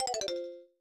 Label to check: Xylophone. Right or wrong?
Right